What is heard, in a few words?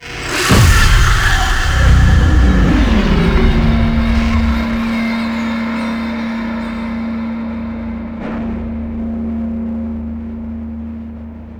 Portal Enter Stinger; Warframe; Shadowgrapher